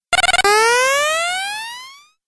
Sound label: Falling Video Game; Video Game Sound Effects